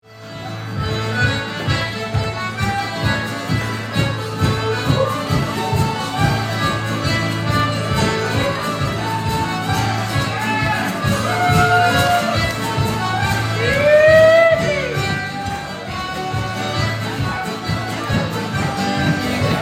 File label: Polka; BelleIsaPolka